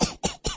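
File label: cough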